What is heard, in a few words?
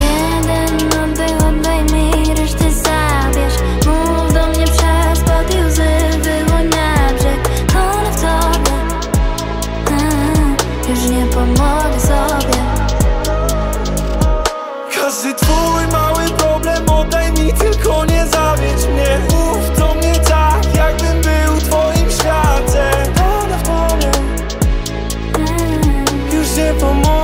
tone